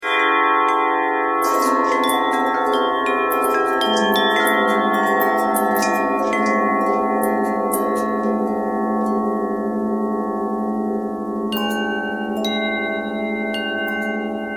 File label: longbell bell bendtwist